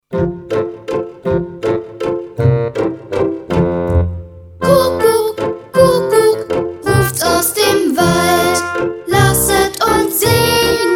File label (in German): Kuckuck Kuckuck ruft's aus dem Wald